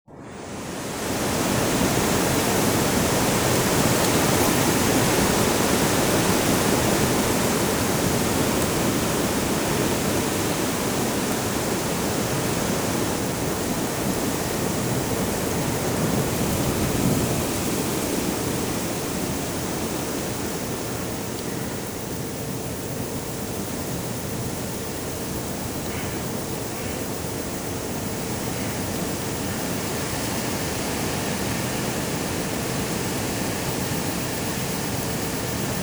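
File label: wind wanders over the hills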